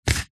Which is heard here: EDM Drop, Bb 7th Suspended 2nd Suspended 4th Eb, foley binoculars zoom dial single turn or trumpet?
foley binoculars zoom dial single turn